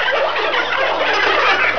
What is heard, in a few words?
LAUGH LOT; PEOPLE